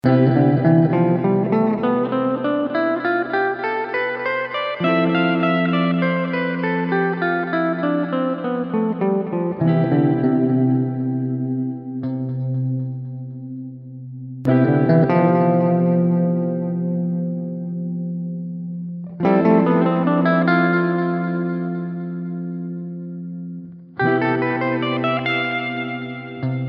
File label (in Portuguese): Modos Gregos Do Jonio